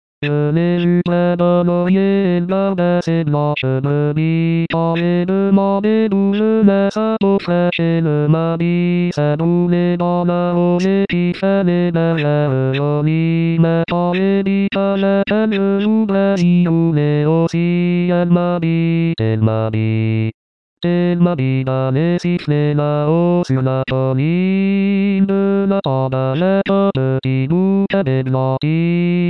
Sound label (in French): zai zai Basse